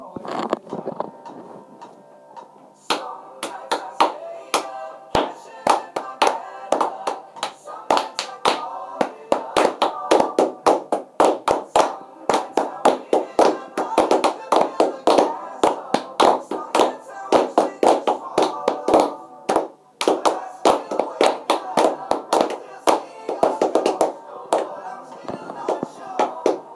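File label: clapping piece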